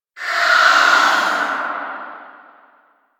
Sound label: Rell Specter Vocal Pain; Enemies; Warframe; Priest Quest